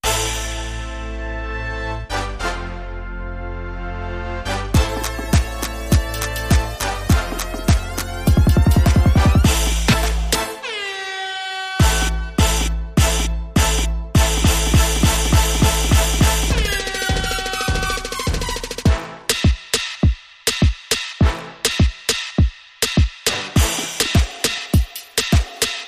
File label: Dancehall Riddim